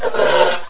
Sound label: headcrab idle; svencoop